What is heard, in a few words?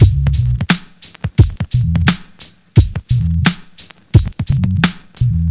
87 bpm drum loop C# key, Free drum groove - kick tuned to the C# note. Loudest frequency: 833Hz